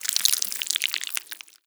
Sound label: flap long, zombie blind jaws; Half Life Alyx; creatures